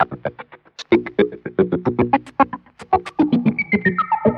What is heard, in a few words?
stutters, electronic loops